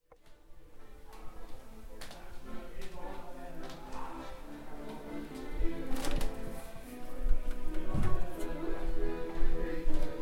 margaretenplatz accordion

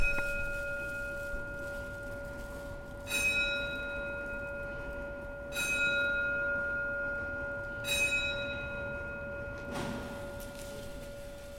marienkirche astron clock 4pm

